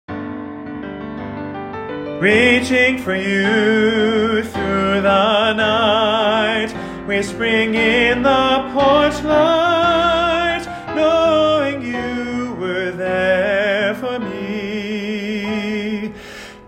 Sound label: Resonance Example